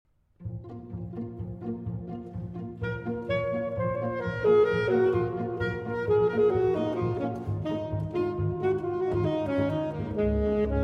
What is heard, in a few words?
Viola Concertino in D-Flat Major (Version for Saxophone & String Orchestra); Allegro; Op. 108